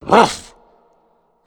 slam fists; bumbino

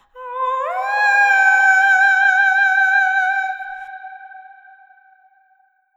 sing; siren

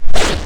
skin pull

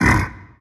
death spawn vox

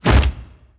bighorn hand appear

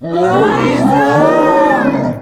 spin attack start; finalmother

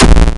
thumbs down

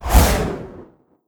portal emerge

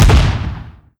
explosion weak

